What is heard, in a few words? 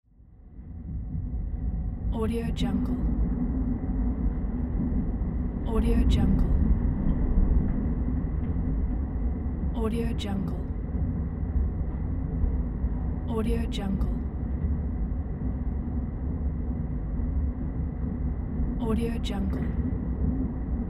haunting ambiance; mrsoundfx